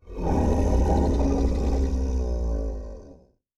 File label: Large Creature Long, Monsters